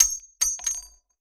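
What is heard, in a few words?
bullet case bounce 50bmg glass; gmod; viper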